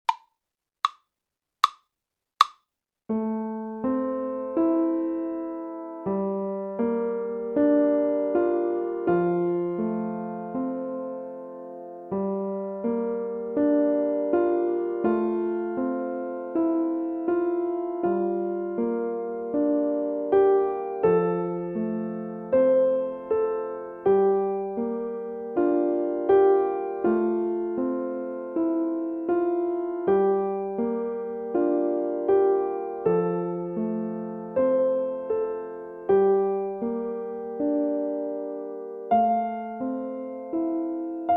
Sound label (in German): Drei Klaenge slow